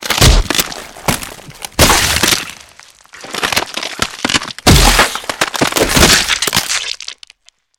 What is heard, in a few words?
Bone Breaks Gushes; punches kicks hits bones